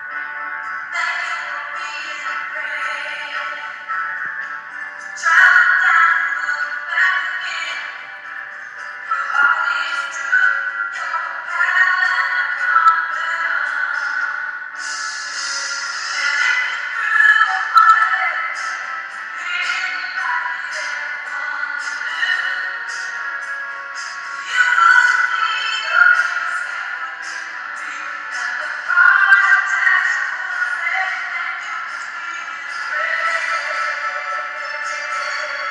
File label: gals in stairs